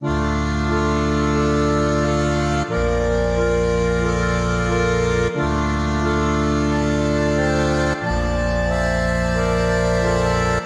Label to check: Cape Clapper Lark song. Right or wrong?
Wrong